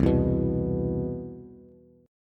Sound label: C Major 7th, Bass; CM7 strummed; JGuitar